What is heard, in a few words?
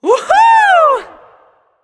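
yay, female; smite; voicepack; hera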